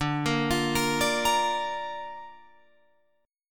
D Minor Sharp 5th D down Guitar Open F; Dm#5 arpeggiated; JGuitar

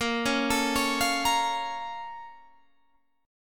Bb Minor Major 7th, Guitar, Open G

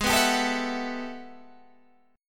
G Major 13th Ab; strummed; JGuitar

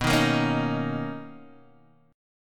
B Major 9th B Guitar Open D

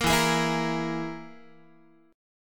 E Major Flat 5th, Guitar; strummed; JGuitar

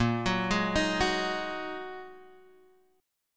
G sharp 7th Suspended 2nd A sharp

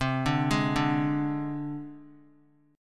C Suspended 2nd Sharp 5th, down, Guitar; Csus2#5 arpeggiated; JGuitar

